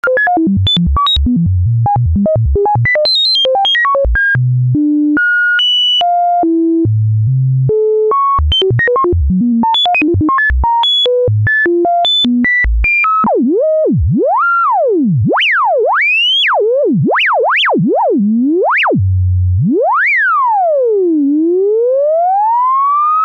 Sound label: random LFO